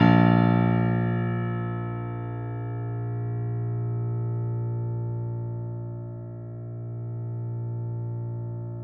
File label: piano; East West; The Ultimate Piano Collection